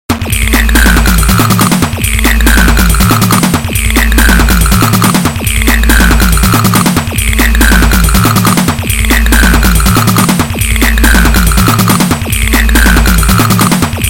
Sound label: LMMS Drumbeat; DjHawk